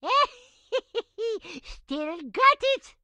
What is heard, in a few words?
chopper hit success; Blind Drive